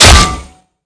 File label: head damage; Valorant